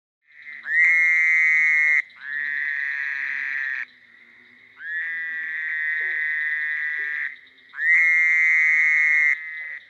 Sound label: Fowler's Toad, Anaxyrus fowleri; Amphibians; Active: Early spring